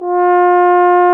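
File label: brass, french horns; Roland - LA Composer vol. 1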